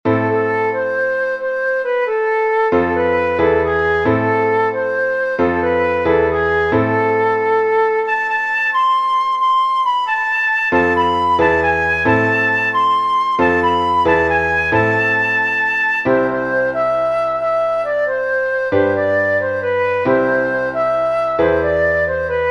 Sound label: Folk